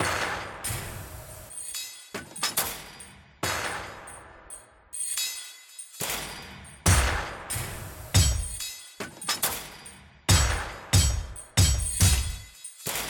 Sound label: Percussion Loop Slow Version; Dual Blade: Battle of the Female Ninja